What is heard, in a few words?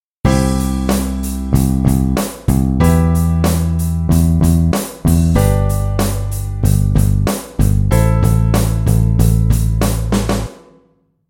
drum bass chord